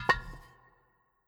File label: Jar; Legend of Keepers